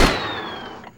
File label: Assault Rifle Shot Loop End; SFX Kit; Weapons